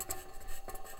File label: mic, mars2020 edlcam